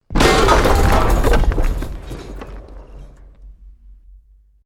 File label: BUILDING COLLAPSE SCAFFOLDING SMALL